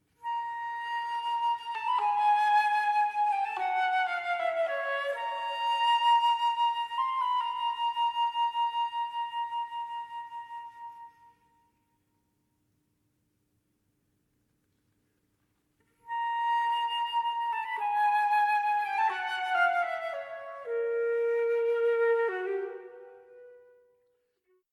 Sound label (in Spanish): flauta sola